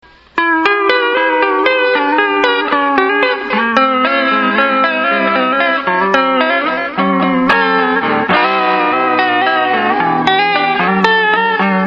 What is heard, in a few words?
vib